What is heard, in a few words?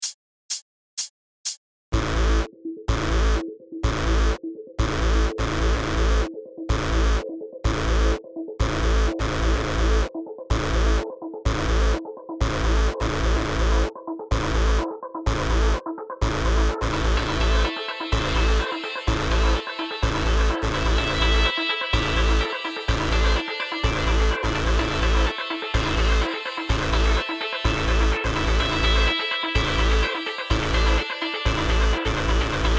Electro playalong